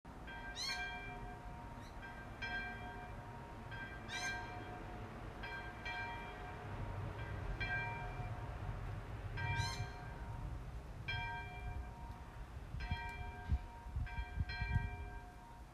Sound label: Bell